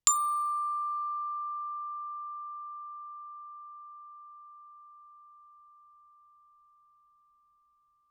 bells plastic ff D6; Percussion

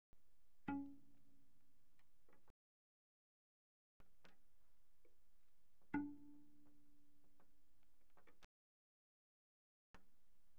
Cello pizz pp sul G Db4 G4; Strings

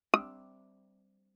Viola pizz ff sul A Eb6; MIS Pitches - 2014; Strings

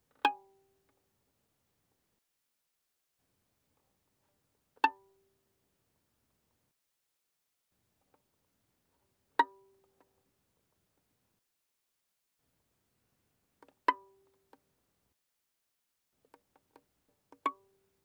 Violin pizz mf sul D Ab5 Db6

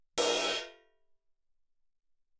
21 ride, choke crash, suspended cymbals; Percussion

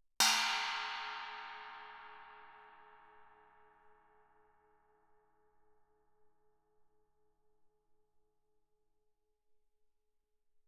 chinese stick bell ff, suspended cymbals; Percussion

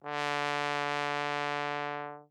Tenor Trombone ff D3; Brass

